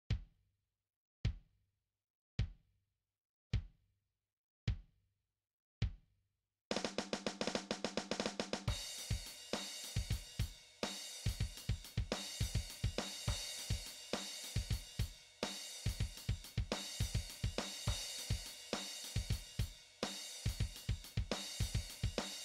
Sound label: Drum Score World Sample